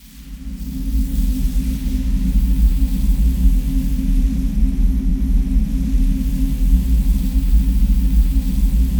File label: TUNNEL RAIN; Distored Reality